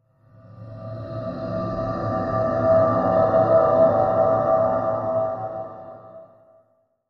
Ghost Movement Spooky Flange Whoosh; Blastwave; Horror

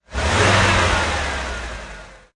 Truck Ford F150 Rev Engine Perspective; Auto, truck, motor. Ford F150 Truck. Rev engine one time, engine perspective.; Car Sound Effects

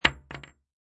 Bullet Shell Drop Machine Gun Hollow Wood; Lynne Music

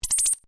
UI Commit, User interface feedback sounds; Computers (Sound Effects:Electronics)

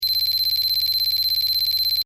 Beeps Data Transmission Technology; Scifi Science Fiction Multimedia; BBFX

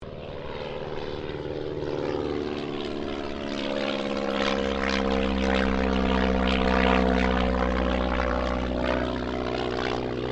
AIRCRAFT DH82A DEHAVILAND TIGERMOTH PASS

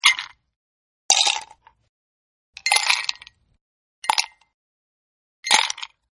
Ice into cup, Ice Being Drop Into A Cup; 4 Takes; Drink Mixing Sound Effects

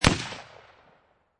Rifle Marlin 30-30 Single Shot Exterior At 45'; Rifle: Marlin 30-30, Single Shot, Exterior at 45 feet

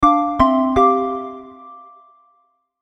Musical Soft, Musical Soft Synth Chords; Musical Soft Synth Chords Reverb; Musical Sound Design; Blastwave

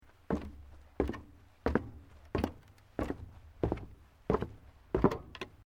FOOTSTEPS SNEAKERS STEPS WOODEN DESCEND SEQUENCE; Footstep Foley; Footsteps (Sound Effects:Human)

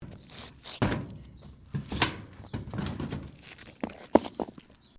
ROCKSLIDE; Material components for constructing more complex sounds

